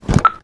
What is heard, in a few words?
mine switch; cdogs